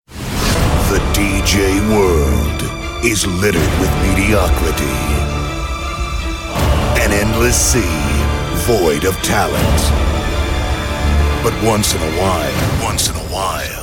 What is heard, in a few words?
Wedding Bells Mix